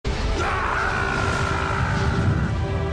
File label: Muaaaaaaaaah